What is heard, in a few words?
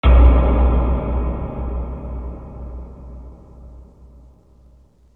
Bass